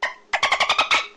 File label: dolphin; minecraft; technic